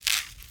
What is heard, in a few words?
Wood Bow Catapult Creak Release; Game Weapons; Military; Game Essentials